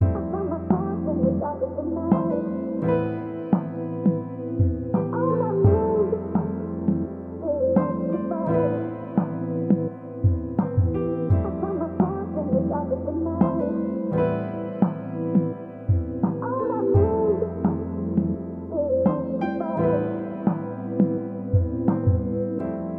170 BPM C Min; Cymatics

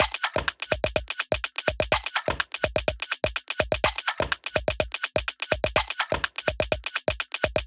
Percussion 125Bpm, techno; Advent Calendar - Day 6 - Techno Kit; Percussion Loops; Greetings

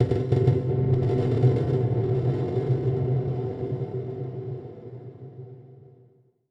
Wet Percussion; Cymatics; GHOST (Beta Pack); Organica

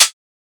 Closed Hihat; Ghosthack - Free Techno Drum Shots 2024

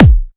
Riddim Kick

